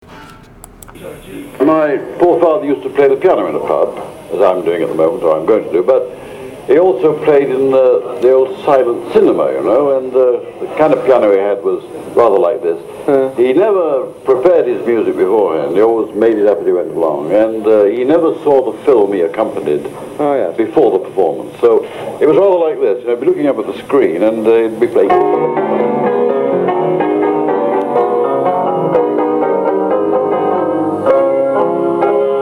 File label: playing piano